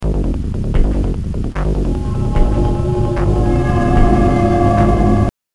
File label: Lose; Splinter Cell; GBA